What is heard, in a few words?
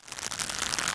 rope; ambient; Shadowman